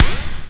spring

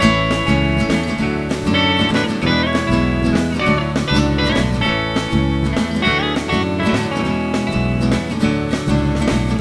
loop

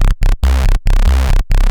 Bass; Techno Music Maker

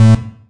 error; forsaken

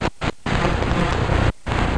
static; MacAddict

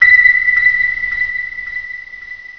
Beat; Quagmire

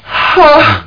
pant; Battle of the Superstars

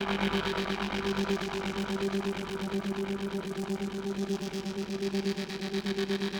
synth; Evolution

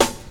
snare

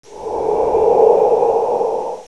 Robot Breath; Gunlok; Robots

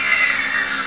grind

